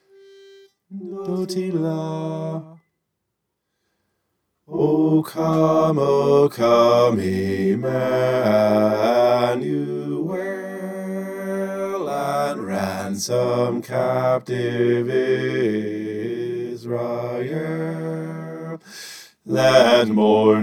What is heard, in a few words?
bass